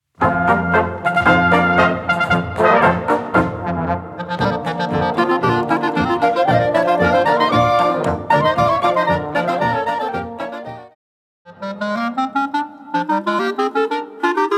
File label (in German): Boehmische Klarinetten